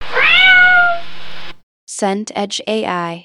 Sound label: cat meow long